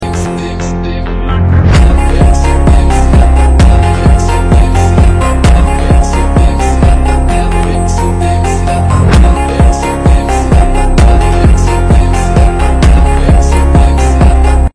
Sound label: Ringtone